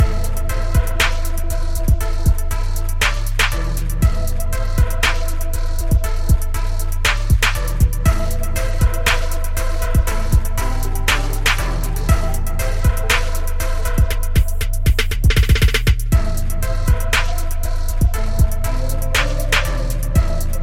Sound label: Alien Trap